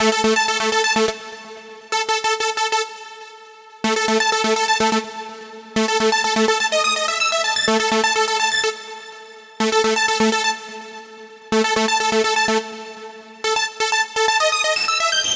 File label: 125 Am Arp, Electro Synths; Prime Loops; Complex Electro Synths; Ratio